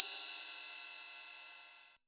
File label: ride, drums; Yamaha SY85